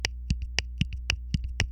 footboard piezo front sample

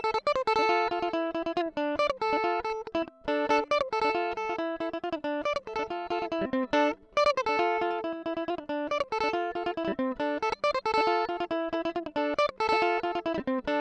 mandolin comparision pickup medium melody

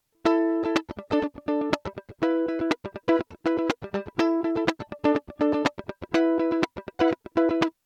mandolin comparison electric chords

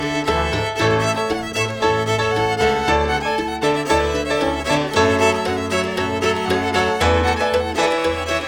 trombone b

